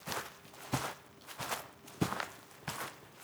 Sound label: Footsteps; Loop